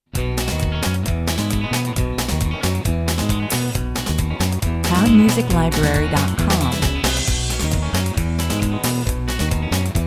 Uptempo country track with electric and steel guitar top lines, and a ska li, 135 bpm; ska like double time rhythm; Great for western or animation